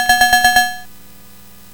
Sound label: sid CSG8580R5, GAMES; Artillery Duel